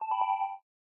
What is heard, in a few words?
SMS in Call; LG P940 Prada 3.0